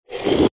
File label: household cafetiere plunger lift coffee inside; zapsplat